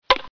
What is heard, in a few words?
ball Hit